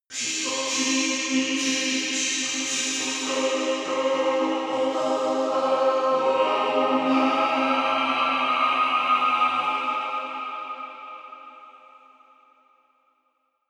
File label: vocoder vocals